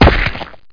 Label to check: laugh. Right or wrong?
Wrong